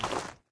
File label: Foot Back; smite; god bakasura